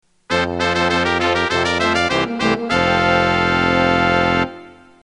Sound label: opening brass